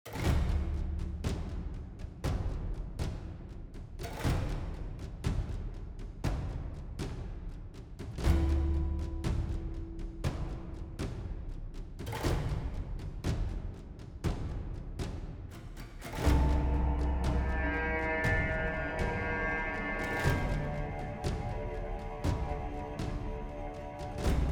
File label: Enemy Camp loop; The Wild Age